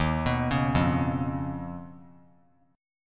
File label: D sharp 7th Suspended 2nd Sharp 5th; arpeggiated; JGuitar